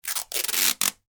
office tape parcel rip, Parcel Tape Rip From Roll; Parcel Tape Ripping From Roll; Offices; McKinney Sound

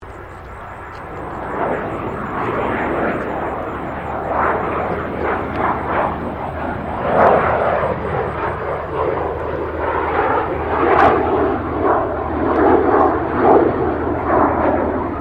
2005 CESSNA CITATION CJ3 TAKEOFF DISTANT

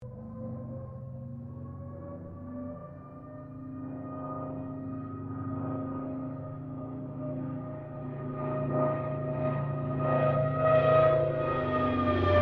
AIRCRAFT CA-13 BOOMERANG LOOP STEREO; Airplanes (Sound Effects:Vehicles)

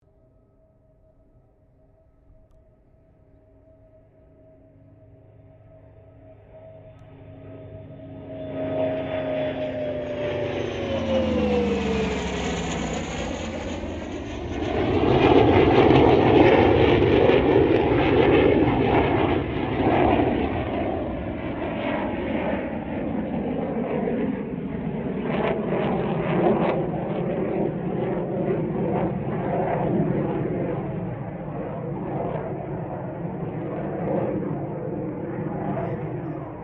AIRCRAFT BOOMERANG SABRE PASS; Mixed aircraft squadrons; Airplanes; Vehicles

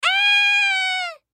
Human Scream; Scream Male Seven Yrs Boy; Blastwave

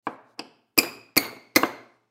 Nail Pull Out Metal Squeak; Hardware; Industry; Machines; Blastwave

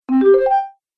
Happy Cartoon Ascending Sound; Cartoon Music

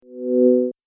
Multimedia Rollover, mouseover sound

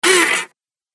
Droid Head Turns Left, robot sound effects; Sci-Fi Robot Sfx

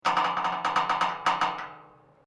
GATE METAL LARGE CREAK, Large metal gate; Gates; Doors

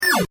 Digital Noise Wave Smash; Digital Clicks, Blips & Alerts

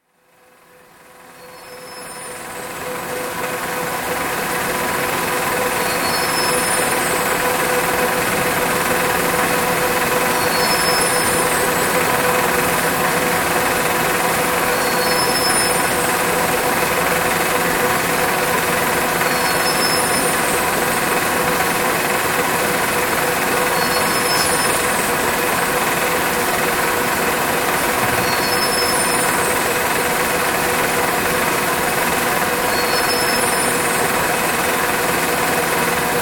Hattersley warping frame; Part of Machinery (Sound Effects:Machines); BBC

